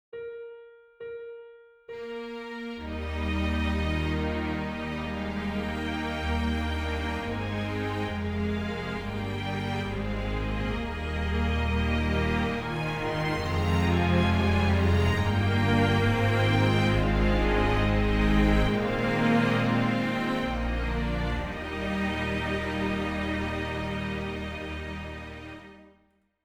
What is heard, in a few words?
Armenian Folk Song